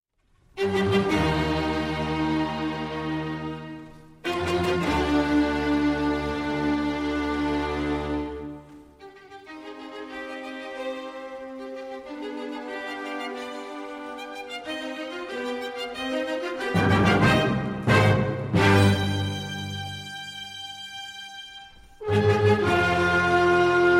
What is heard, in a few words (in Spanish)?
orquesta